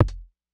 SIGHTS ON KICK

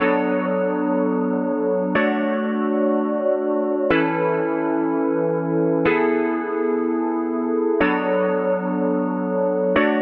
Midnight Dancefloor Kit 123bpm Keys

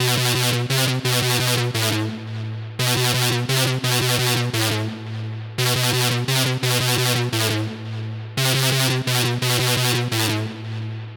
Synth Distorted 172 Bm

